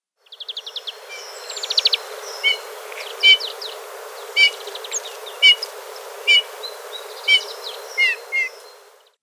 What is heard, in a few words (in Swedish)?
trumpetande domherre